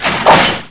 gate

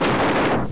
MECH WEA

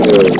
4down; Funhouse of Love

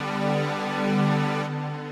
SYNTHPAD HOUSE 125 A; eJay; Sphere

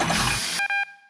powerup cooler; Ballist

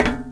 metal; Shadowman

